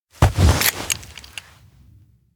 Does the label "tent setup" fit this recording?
Yes